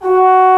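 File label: Trombone mf F#3; Digital Sound Factory Virtuoso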